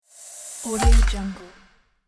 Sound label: sweep creak smash